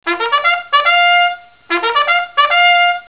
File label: trumpet